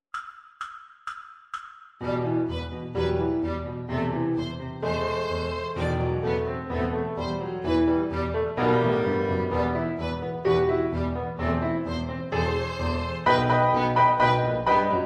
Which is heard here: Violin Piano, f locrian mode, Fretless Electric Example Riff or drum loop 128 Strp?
Violin Piano